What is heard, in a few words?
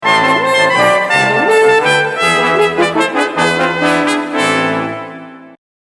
Waltz horn ending; Brass